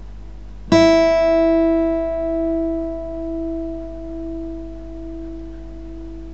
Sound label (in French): mi aigu, guitare